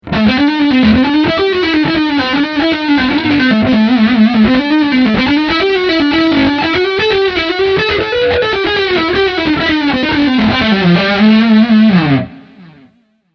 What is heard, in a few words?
Solo Front Humbucker